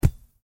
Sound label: ball catch; csgo